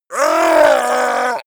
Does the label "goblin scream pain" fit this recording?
Yes